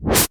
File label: cinematic impact wipe